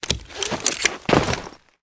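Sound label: clatter of a dropped weapon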